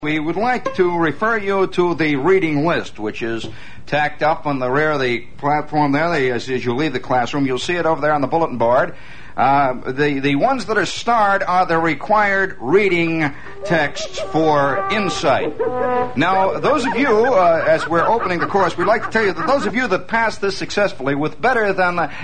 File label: Laugh; Okeh